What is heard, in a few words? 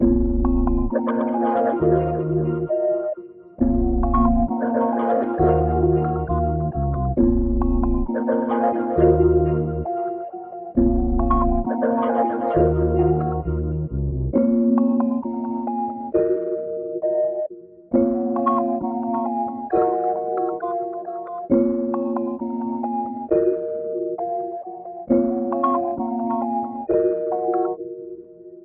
134 BPM A# Min, Melody; Tenebris; Cymatics; Cymatics - 2022 Melody USB Expansion